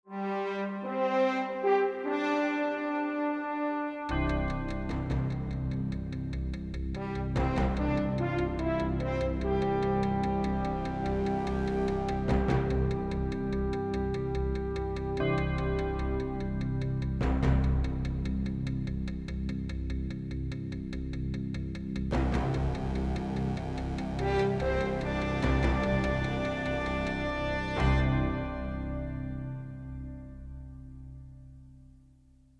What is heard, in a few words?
Hard Promo Bed